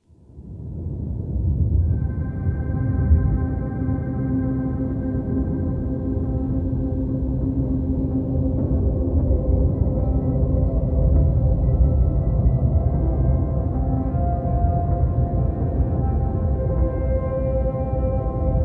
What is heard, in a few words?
Under The Waves Music Bed